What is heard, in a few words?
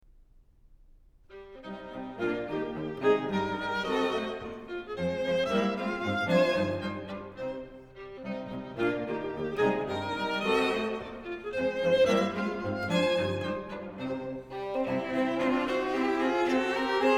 String Quartet No. 4 in C Minor, Menuetto (Allegro) - Trio; Ludwig van Beethoven; Beethoven - String Quartets No. 1-6, Op. 18